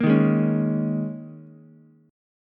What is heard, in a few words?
Eb 7th Suspended 4th Eb, Ukulele; strummed; chord